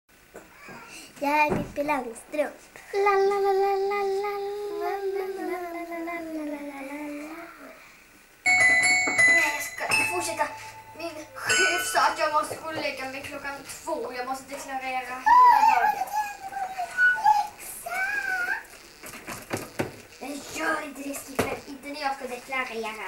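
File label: piano instrumental; skippers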